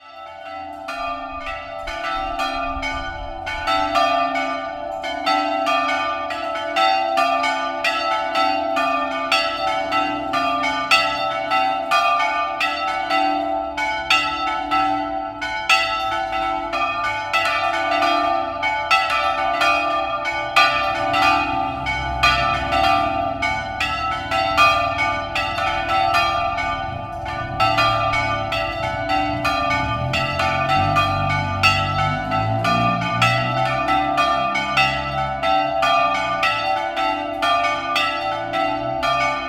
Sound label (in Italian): Montereale, campane; B V delle grazie